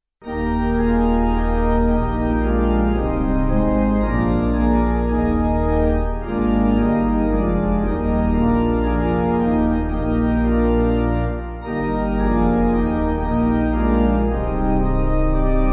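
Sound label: Pipe Organ, Music Backing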